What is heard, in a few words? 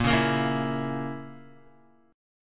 A sharp Diminished Guitar, chord; strummed; JGuitar